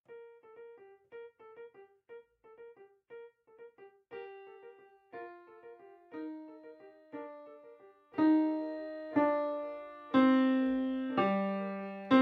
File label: bells tenor